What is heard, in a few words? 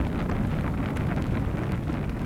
green Flame; hiddencoinsofegypt